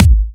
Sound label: Hard Kicks; Vengeance Samples